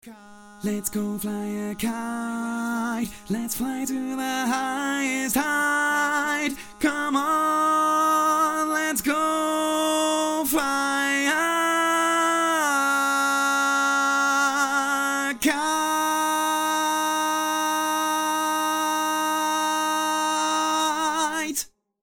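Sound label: Baritone, barbershop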